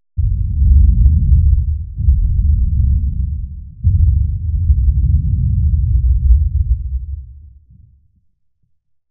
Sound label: Explosion, SUB; 5.1 Surround